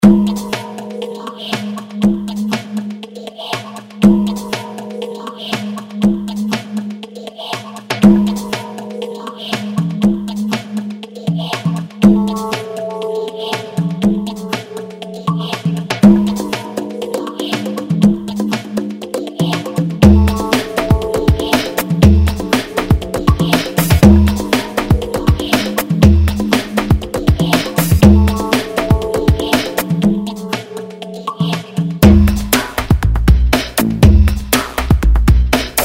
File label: VIBRATION